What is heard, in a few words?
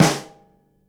SNARE